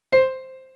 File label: Piano Key; Phasmophobia